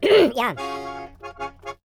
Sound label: YODEL YELL, World Voices